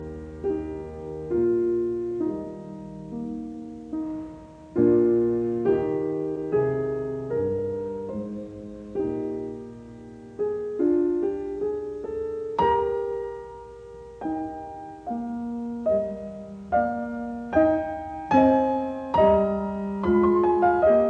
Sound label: Piano Sonata No 17 in B flat major K 570 II Adagio; Lili Kraus